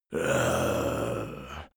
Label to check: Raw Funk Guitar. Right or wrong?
Wrong